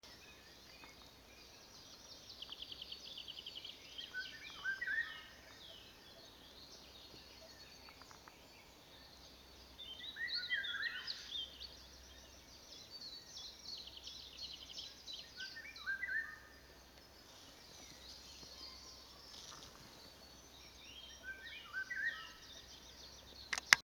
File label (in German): Pirol im Recker Moor